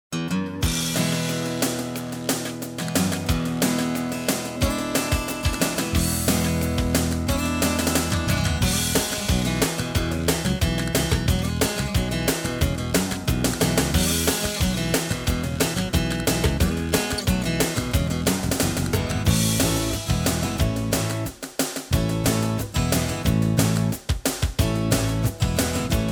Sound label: country